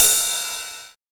Ride Cymbal A Key, Royality free ride one shot tuned to the A note.; cymbal drum samples